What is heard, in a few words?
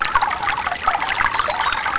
water; DesktopMAGIC v2.0